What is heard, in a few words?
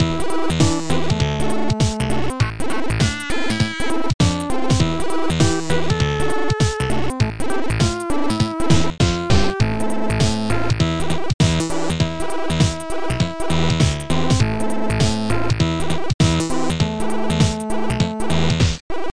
KEYGEN MUSIC RINGTONE; ABSOLUTE PATIENCE